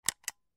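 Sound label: bullet click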